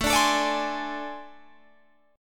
A Minor Major 7th Double Flat 5th A, Guitar, Open G